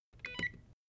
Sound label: Alarm Keypad Entry; hi-tech-various